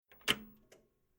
Household Old CRT Television Switched Off Via Button; Tom Hutchings